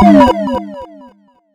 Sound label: blow, electrical